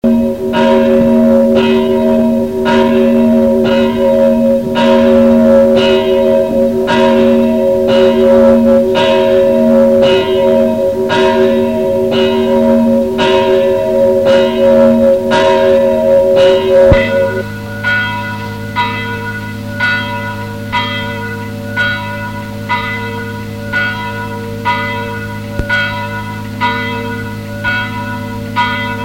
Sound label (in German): glocken; Groß-Alischer Kirchenglocken